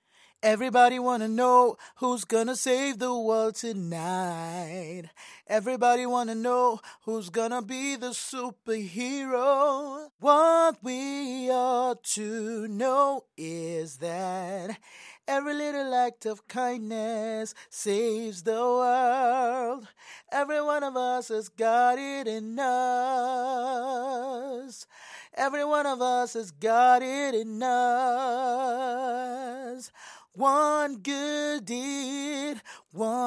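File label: pop rnb, 94, B minor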